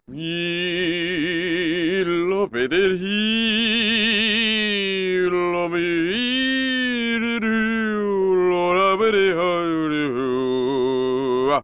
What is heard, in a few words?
singing